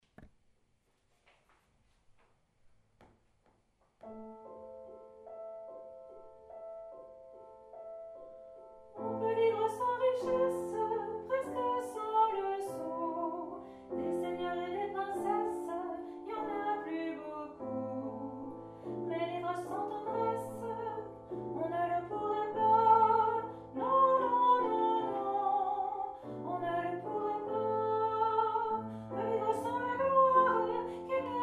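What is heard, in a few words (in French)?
Ténor, Choeur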